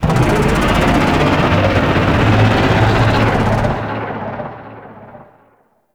Void Dragon Vocal Idle; Enemies; Vocals

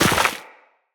Run Deep Snow, Footsteps; Characters; Operator

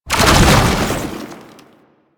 Run Start, Foley; Enemies; Warframe; Proto Infested; Babau

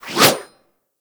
Axe Small Swing; Warframe; Weapons; Swings

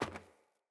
Sandals Land Metal, Footsteps; Player

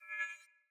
Long Sword Whoosh Accent; Weapons; Player; Swords; Pothia

